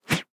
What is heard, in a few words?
Card Rotation; UltimateFishing2020